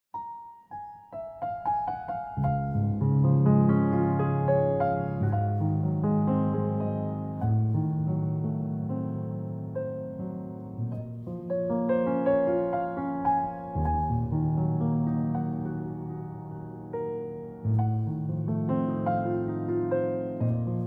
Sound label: Solo Piano